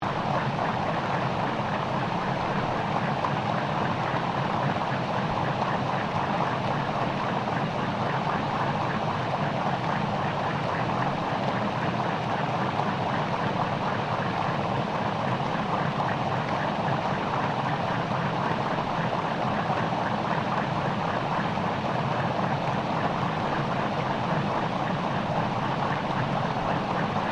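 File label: Boat Custom Restaurant Boat Large Engine Submerged; Resteraunt; Boats (Sound Effects:Vehicles)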